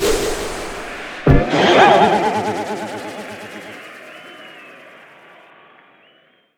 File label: taunt; phantom lancer